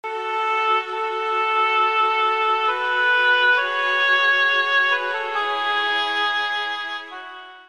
theme of sadness ensemble version

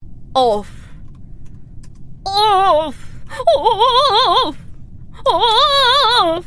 oof

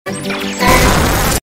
glitch error

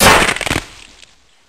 hit sound effect

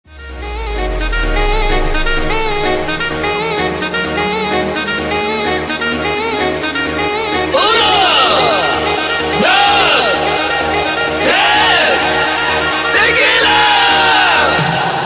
salsa

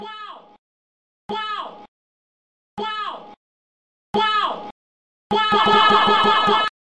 crash wow

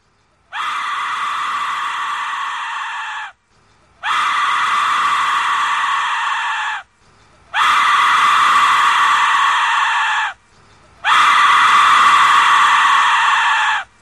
screamin louder louder

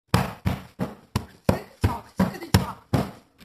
tic toc tickety toc

